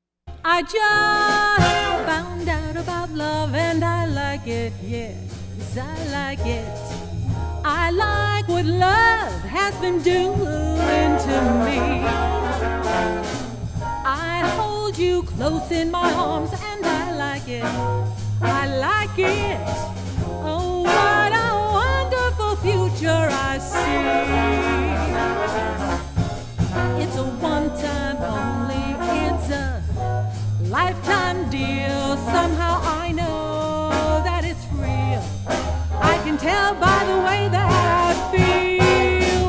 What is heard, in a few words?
Jazz Combo Vocal; Premier